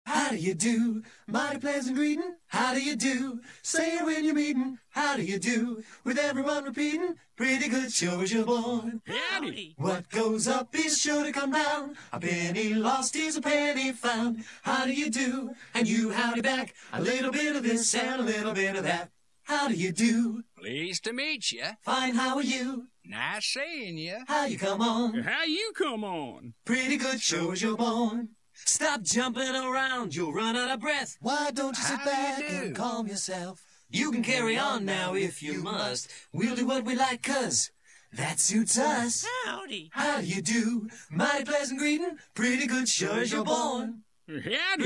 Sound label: How Do Ya Do Male Vocal